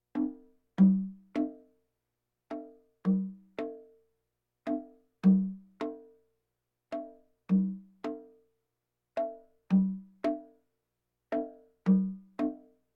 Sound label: Percussion Bongos Tympani rolls Gongs Drums